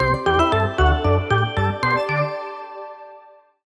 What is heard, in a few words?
win; Amatic; Fortune Girl